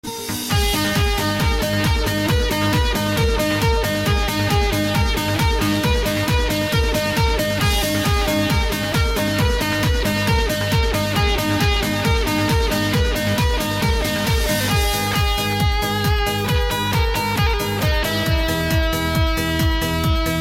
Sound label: Guitar